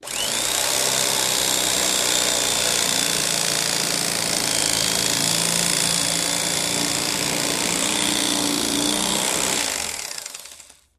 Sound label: Elect Knife Cutting, appliances